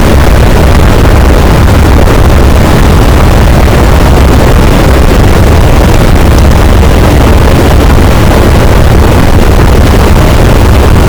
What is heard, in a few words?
cachalot, boat; Physeter Macrocephalus; SNR 0dB